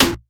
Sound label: clicky snare sample C sharp key